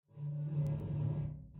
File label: Metal Creak